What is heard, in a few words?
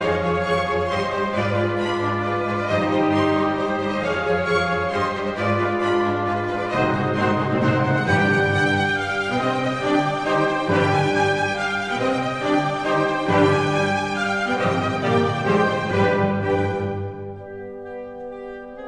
piano concerto c moll allegro